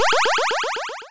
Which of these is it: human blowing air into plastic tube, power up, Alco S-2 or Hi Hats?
power up